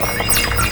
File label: Mechanism - 3A; Jungle; FX; Blu Mar Ten - Jungle Jungle - 1989 to 1999 Samplepack